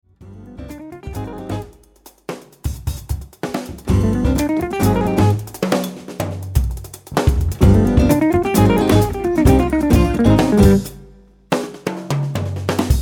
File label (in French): RYTHME FUTUR